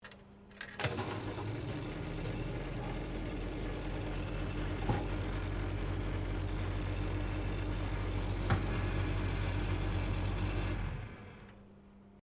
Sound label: SLOT MACHINE FORTUNE TELLER OPERATE, Various old coin slot machines; Slot Machine Fortune Teller 1940 Operate